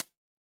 ui hover

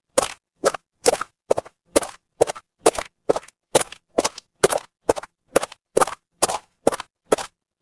footsteps gravel

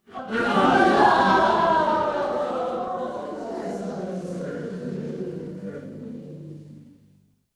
crowd gasp

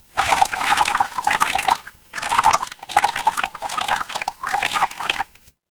water swirl

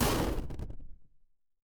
retro game explosion

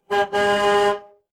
mechanical horn

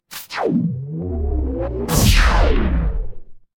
magic scifi laser

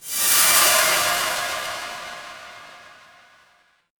magic scifi spell

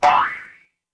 cartoon bonk

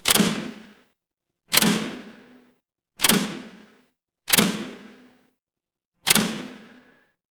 mechanical lever

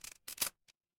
door lock

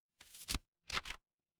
paper fabric page turn

